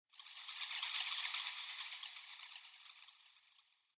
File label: Bats; RadwinIsland